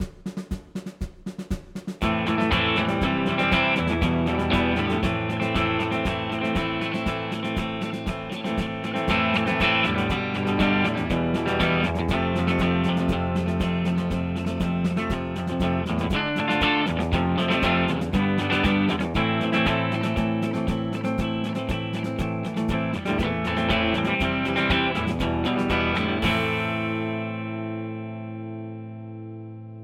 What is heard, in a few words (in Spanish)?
Oeste Backing Track